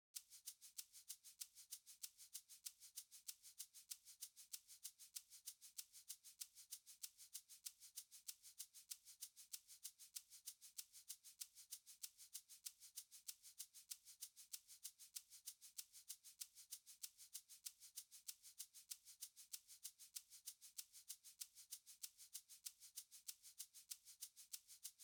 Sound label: Shaker Percussion Study